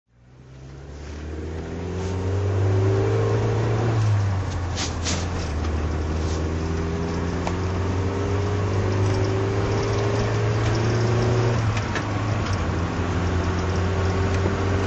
lotus esprit drive

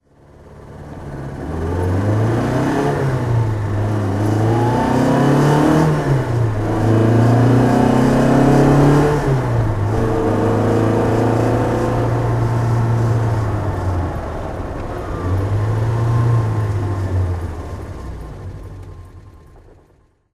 lancia fulvia 1600 driving, Engine sound driving; Lancia Fulvia rally car, 1972

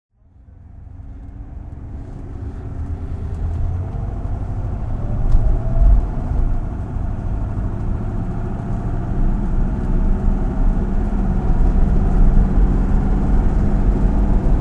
rover p5b coupe drive

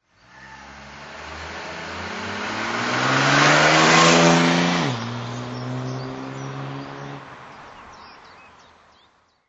280sl drive off

